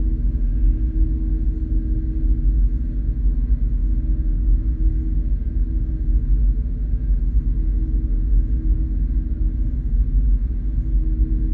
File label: Ambience; A New Hope; Lego Star Wars (PC)